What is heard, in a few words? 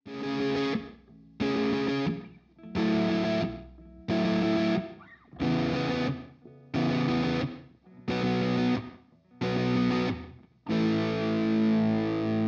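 neck drive, electric guitars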